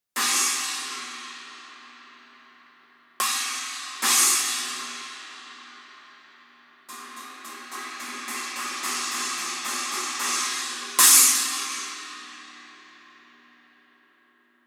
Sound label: Custom China 20